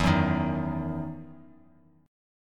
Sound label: E Major 7th Suspended 2nd E, Bass Standard; strummed; JGuitar